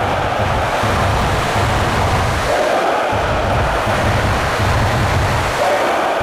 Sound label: crowd loop England; netent